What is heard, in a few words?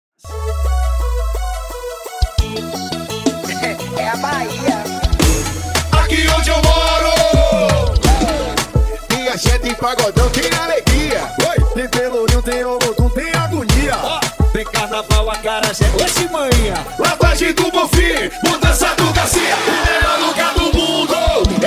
Zouk Music